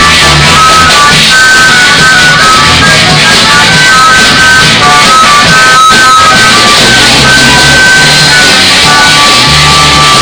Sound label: blues jam session, station hotel